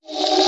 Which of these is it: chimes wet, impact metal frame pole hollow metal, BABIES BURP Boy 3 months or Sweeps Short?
Sweeps Short